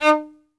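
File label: SOLO VIOLIN, Synclavier Strings; ILIO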